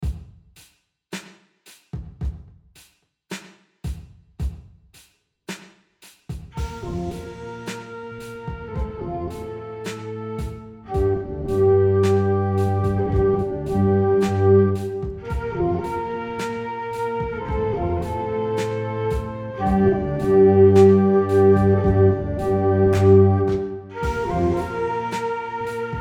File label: shakuhachi